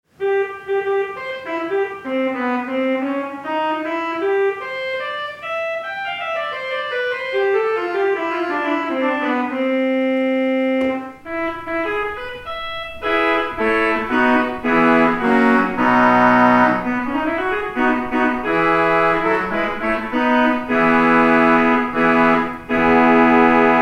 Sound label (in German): Feldtrompete, Rundfunkorgel